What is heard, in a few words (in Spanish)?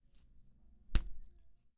Agarre